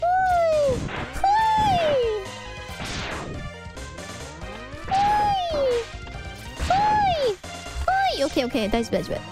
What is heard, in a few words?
hoiii hoiiii